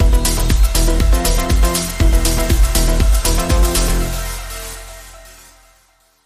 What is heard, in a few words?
Link Sting